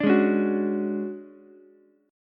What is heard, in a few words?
D Major 7th Flat 5th D down Ukulele; strummed; JGuitar